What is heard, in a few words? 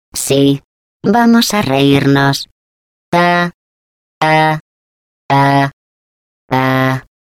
laugh, es; GLaDOS; taunt